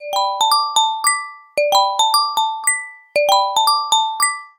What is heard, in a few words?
Glass Bubbles